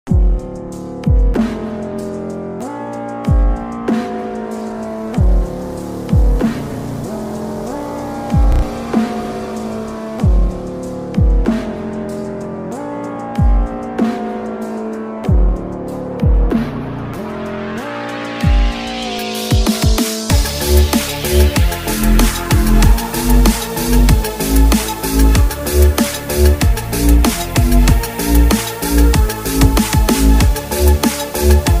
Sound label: FUNK Retro-Funk